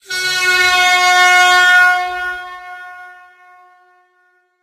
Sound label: horn air; source engine; tf; passtime; video games